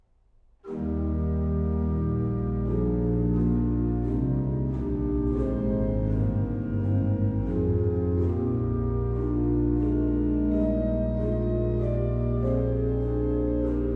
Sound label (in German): Andante, Orgel; Sonata d-Moll MWV 61 op. 65 Nr. 6 - Finale